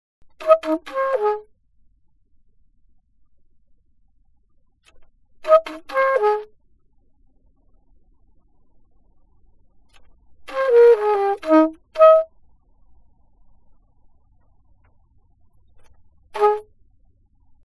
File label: altosax